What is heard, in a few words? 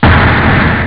vert, drums snare; OctaMED SoundStudio V1